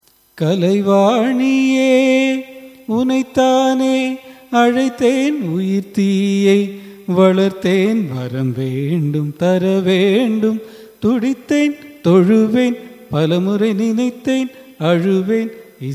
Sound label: raga kalyani cine; carnatic music